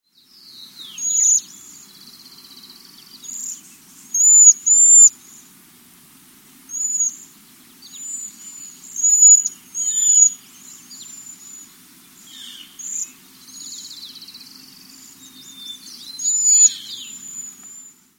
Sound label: cedar waxwing